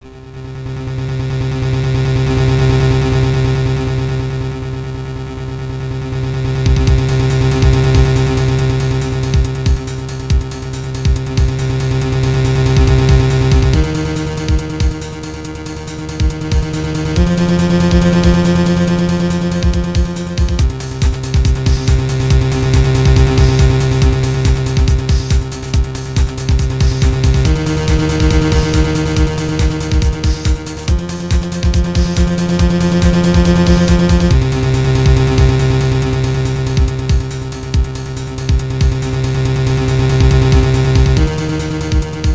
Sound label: suspense; ace attorney